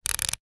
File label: leisure toy plastic wind up; zapsplat